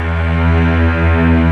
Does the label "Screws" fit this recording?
No